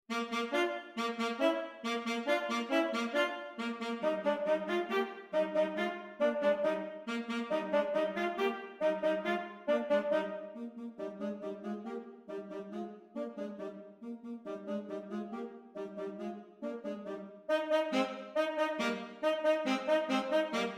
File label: Quick Step; Kaisershot; Just for Two CHARACTER DUETS